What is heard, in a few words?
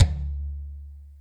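TOM soft; Zero-G; Chemical Beats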